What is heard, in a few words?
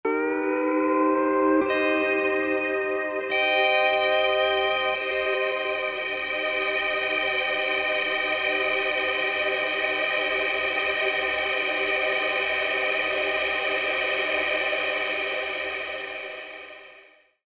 Pro R2 Guitar Freeze